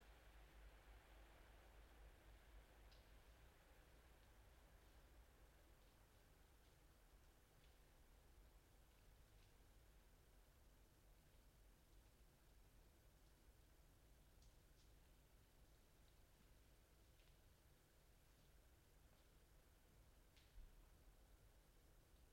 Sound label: yamanakako sound